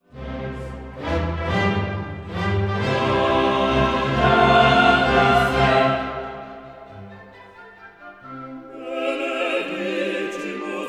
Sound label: Gloria Laudamus te